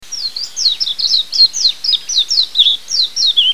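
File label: Japanese white-eye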